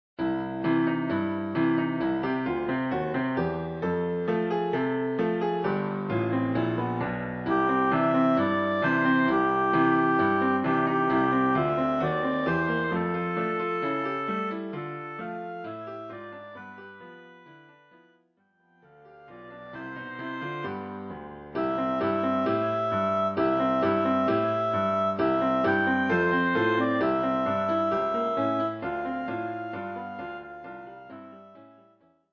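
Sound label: oboe; Jingle Bells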